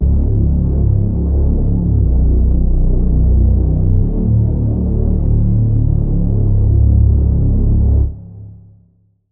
Reese

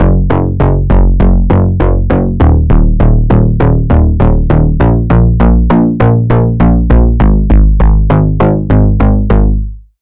Bass; Neon Iron; Routed Sound; Vital Expansion - Reality Maker Vol.1

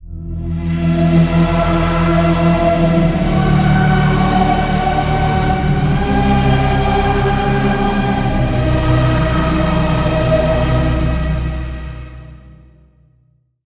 Pad, Serum 2; Pad - Wasp Nest; Routed Sound; Serum 2 Expansion - Quantum Enforcer Vol.1

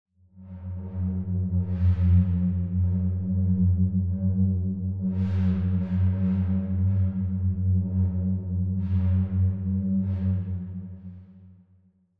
Pad, Sylenth1; Pad - Half Life; Routed Sound; Sylenth1 Expansion - Doom Carpenter Vol.1

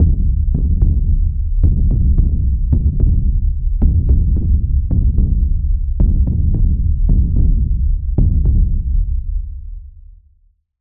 Impact - Undulate, Sylenth1; Routed Sound; Sylenth1 Expansion - Quantum Enforcer Vol.1

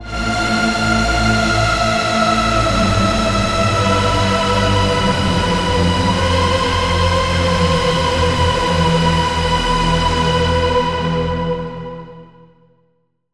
Pad - Heaven Choir; Routed Sound

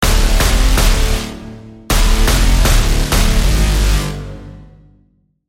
Braam; Routed Sound; Serum 2 Expansion - Quantum Enforcer Vol.1

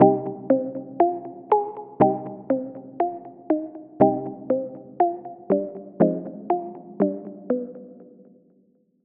Pluck, Spire; Hideout; Routed Sound; Spire Expansion; Street Vanquisher Vol.1

